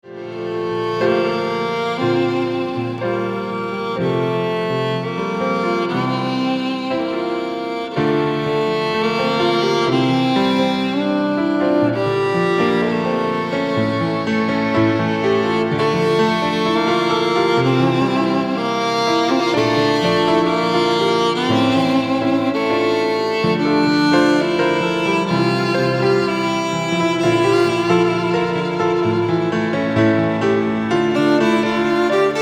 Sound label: Instrumental